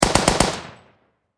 Warfare Machine Gun Vickers Short Burst Close Perspective; SFX Bible 6